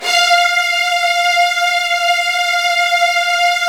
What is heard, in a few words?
F2, Virtuoso Strings; ILIO; Kirk Hunter Virtuoso Strings VOL-1